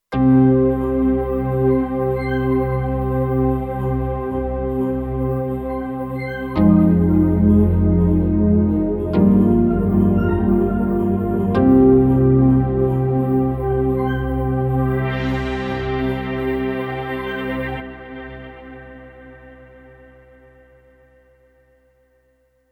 Kurzweil K2500X Aqua Choir

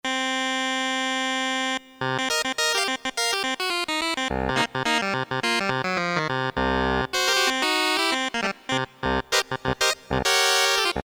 Clav, Korg DSS-1

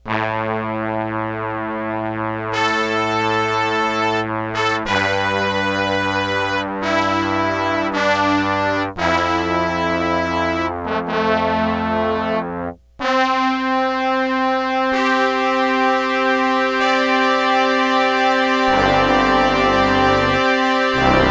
TROMBONES HORN SECTION UNISON

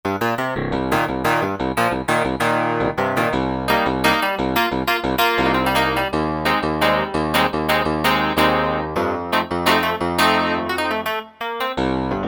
Steel Clav

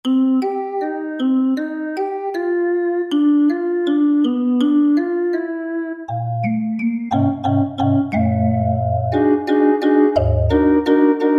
Clip Clop; Factory Patches

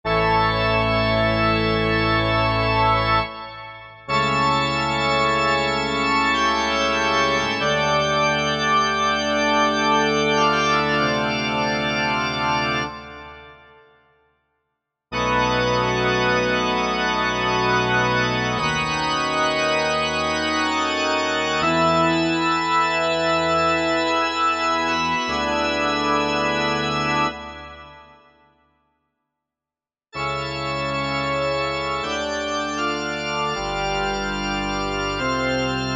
Pipe Organ, Korg MSC-9S Organ

